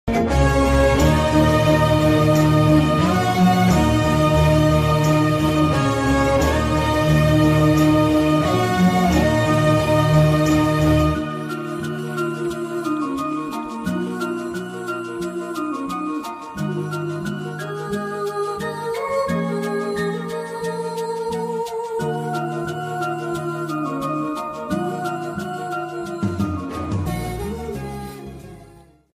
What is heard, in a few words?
heart touching bgm ringtone; heart attack movie